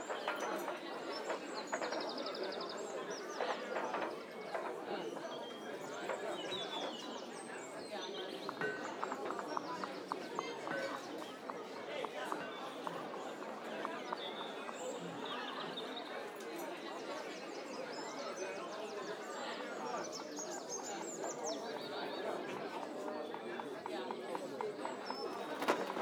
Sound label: Village Loop, Ambient; Fantasy Sounds Bundle